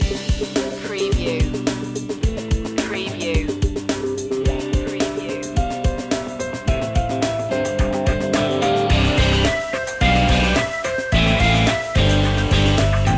happy girl loop

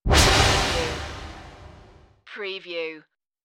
Wipe

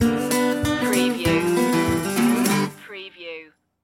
blues stinger

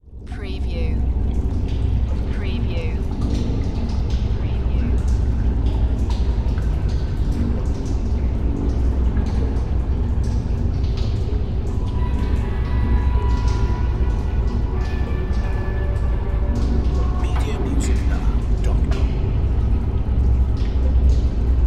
Spaceship Power

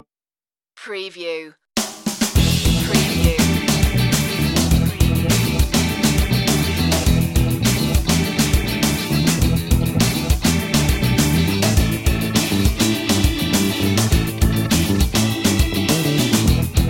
chat show guitars organ

